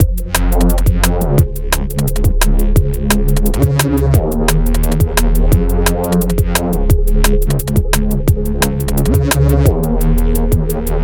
bandpass style filtering full mix; Time automated

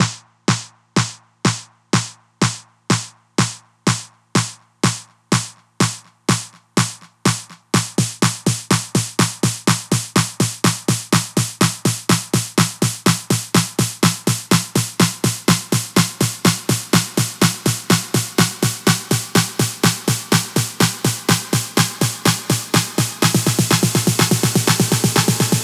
Snare Build DRY; Heatwave

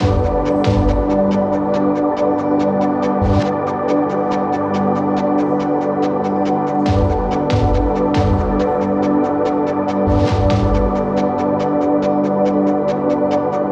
Auto flutter No submerge mix

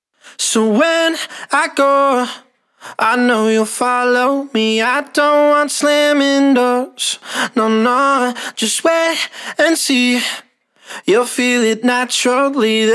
male chipmunk DRY; metapitch

